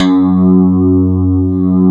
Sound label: CLAV, FUNK FM CLAV